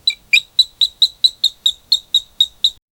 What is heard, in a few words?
small rat making noises; cozy